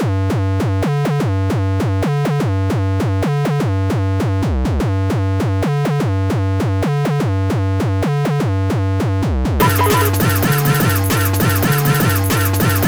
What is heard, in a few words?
hardcore